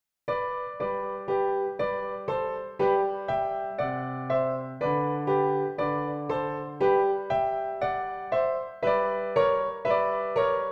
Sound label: Dance PianoTeq Bechstein